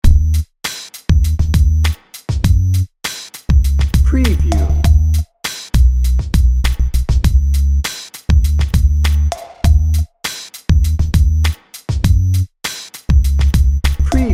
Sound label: dnb